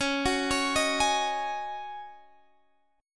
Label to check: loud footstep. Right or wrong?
Wrong